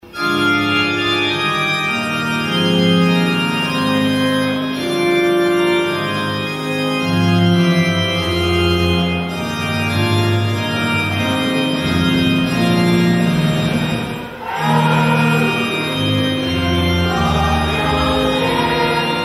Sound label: Anthem; ACU